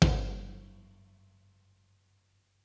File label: Floor, Kit; One Shots; Touch Loops; ClassicBreakSessions